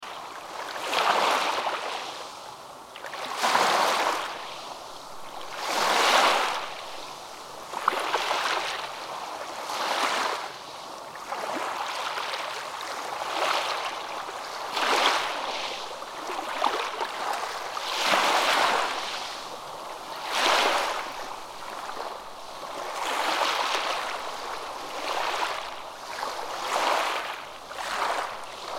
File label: Nature sounds ocean waves for relaxation